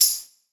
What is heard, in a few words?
Tamb Processed; Drums; Tambourine